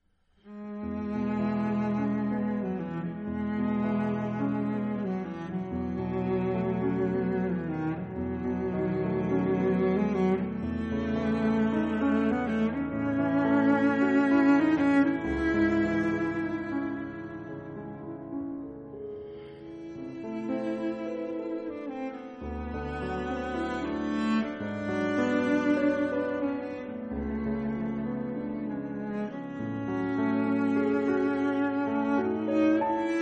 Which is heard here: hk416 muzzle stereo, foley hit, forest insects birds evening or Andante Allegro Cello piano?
Andante Allegro Cello piano